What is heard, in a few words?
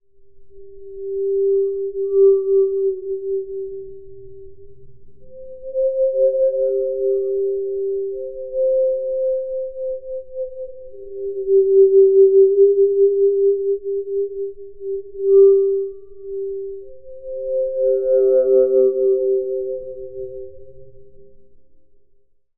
Haunted, Atmospheres; Zero-G Ambiosis